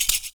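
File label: PERC, percussions; Univers Sons - Raricussions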